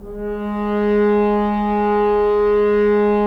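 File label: Solo French Horn; Roland - LA Composer vol. 4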